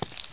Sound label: run